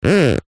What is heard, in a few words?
industrial duct tape rub finger squeak